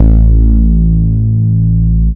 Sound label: BASS; SoundFactory